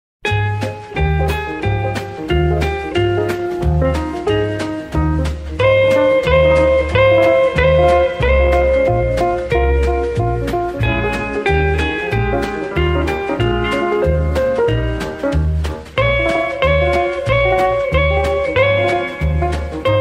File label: Instrumental; Julekalender 2022